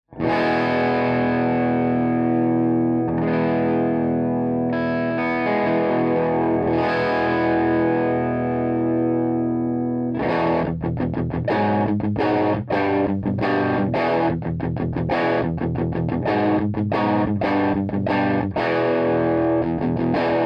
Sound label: AC30 BLEND